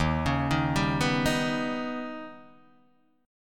Eb Augmented 7th, Guitar, Open D

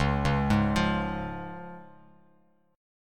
Db Major Db, Bass Standard; arpeggiated; JGuitar

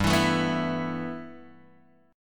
G 6th Add 9th, Guitar, Open G

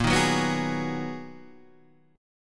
B Major 13th A sharp, guitar

